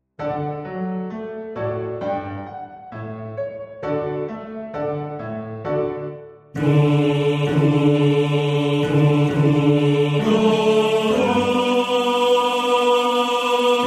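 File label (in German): Tenor 2, Männerchor; Christenglaube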